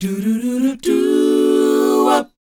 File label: DOWOP E, Vocal R&B DOO WOP; ILIO; Vocal Planet